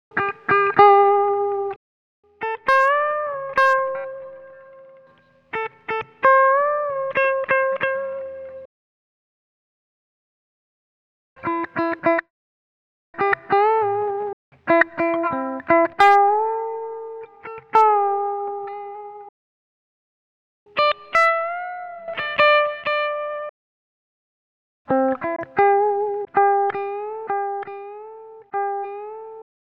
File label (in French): funky gratte